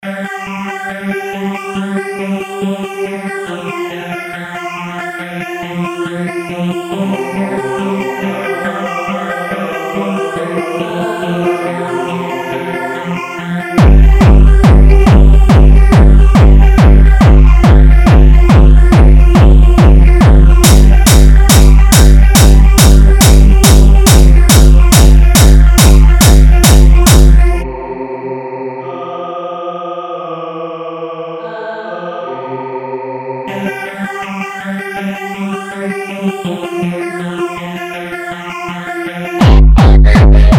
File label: hardcore